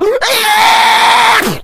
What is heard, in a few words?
rage at victim, infected, male